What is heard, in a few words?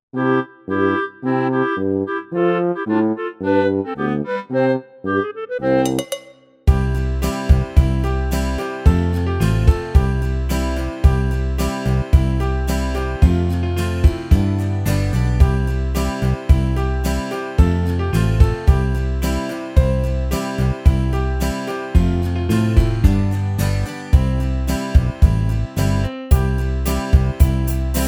Karaoke